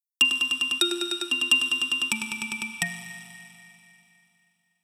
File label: Dog Phone